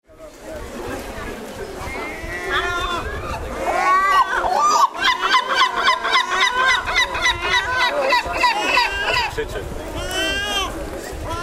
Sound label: yellow legged gull